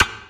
percussion oneshot thrift; Pop; Drums; SD PASADENA Pop Sample Pack; Slate

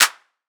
clap crispy, Hip-Hop; Drum One Shots; SD CAICOS Hip-Hop Sample Pack; Slate

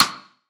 perc clams; Drum One Shots; SD MIDNIGHT Sample Pack; Slate

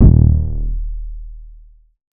808 one shot Eb; Slate Digital BASSHOLE Sample Pack

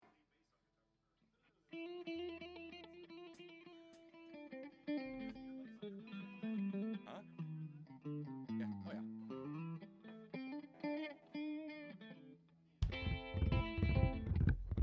Strings Mix

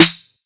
snare splash; one shots; SEISMIC Drum Sample Pack; Slate

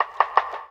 perc ghost; Drums; One Shots; Percs; Slate; SD AURORA Sample Pack

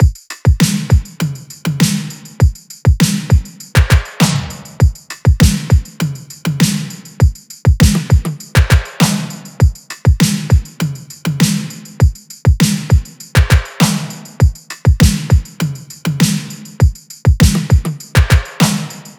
drums 100, Drum Loops, Full Drums; Countach; Slate; MIDNIGHT Sample Pack

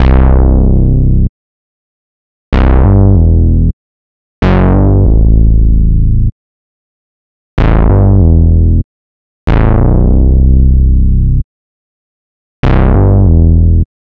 Synth loop 95 Em, Synth Bass Loops; Slate Digital BASSHOLE Sample Pack

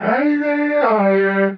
vocal hazy G; SD AURORA Sample Pack; Slate

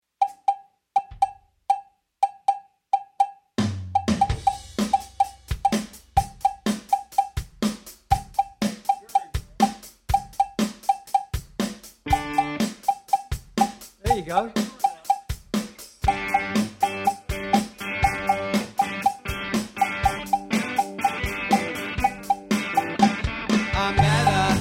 vocals

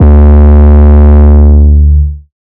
808 Bass Powertool D3; Slate 808 Deep Dive; SD Signature 808s

